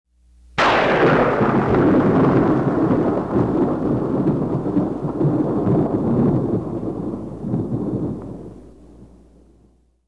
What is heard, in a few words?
very sharp thunder